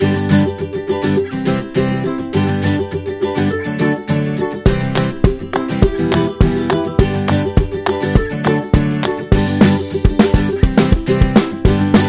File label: A Funny, happy music